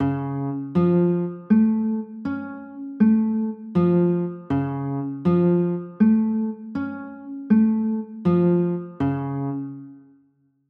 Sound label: c sharp aug, chords